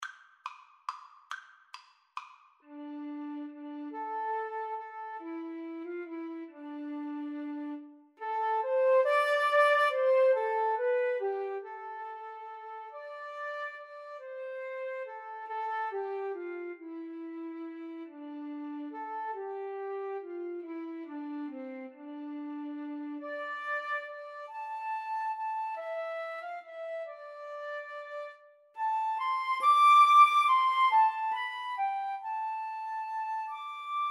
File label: Flute Clarinet Duet